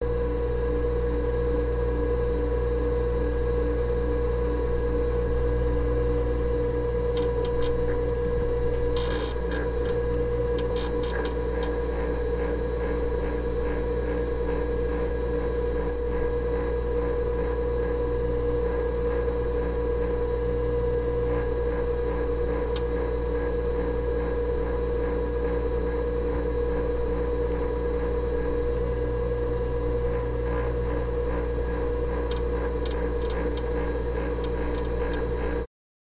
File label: Ambiance, Machine; Ambient Machine Throb Moves Slowly Into A Second Textured Throb